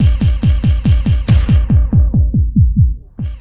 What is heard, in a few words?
Percussion, Trance NRG, Drums